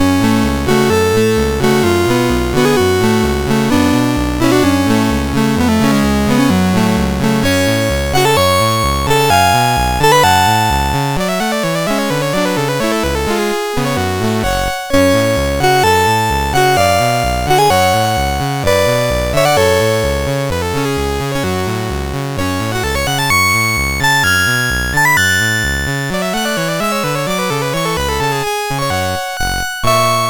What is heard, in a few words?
Hungarian Dance No5, MOS6581R3